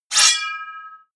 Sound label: sword pull sword knife out